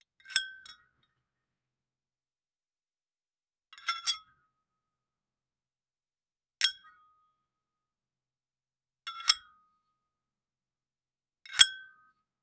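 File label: create a very quiet subtle, latch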